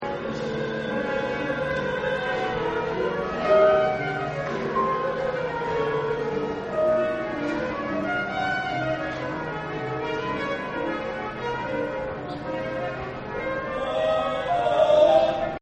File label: Music School; Buildings; Partners In Rhyme